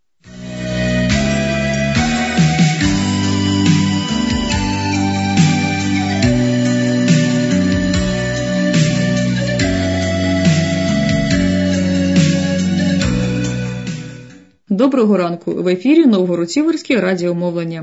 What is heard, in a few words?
interval signal; ur novhorod siverskyi